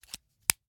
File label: Hwatu, card game, hard plastic, small, clatter, pull, slap, throw down, single card, strong; Eiravaein Works; Vaeyan IV